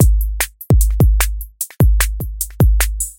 base beat

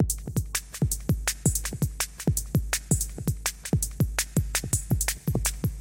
chopped beat

